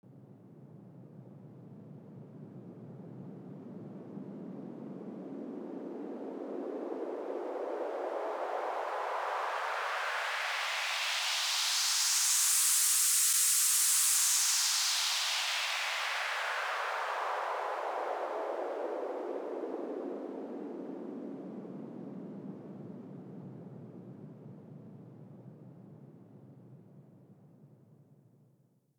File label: 144 BPM Fx Sweep; Vengeance - Essential Clubsounds Vol.5; Flight Kit Root Amin